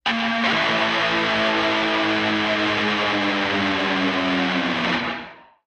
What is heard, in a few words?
Music E Guitar Weird